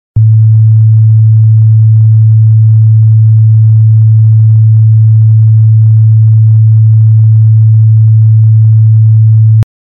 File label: 110 Hz tone